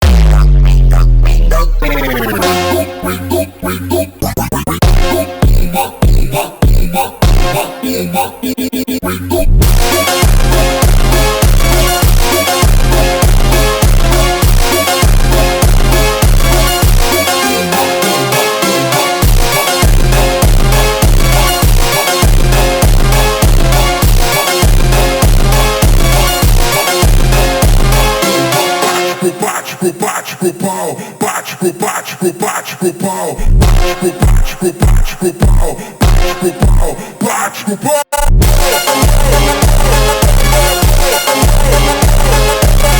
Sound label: montagem phonk